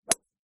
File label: Golf Iron Shot